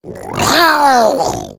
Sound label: female boomer spot prey, voice, attack; Left 4 Dead 2